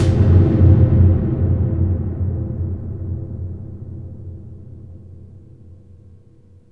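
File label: CAVERN, AMBIENT DRUMS; Spectrasonic Distorted Reality 1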